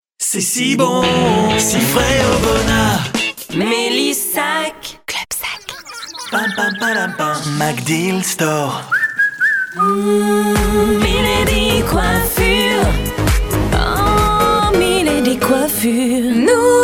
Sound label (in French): JINGLES CHANTES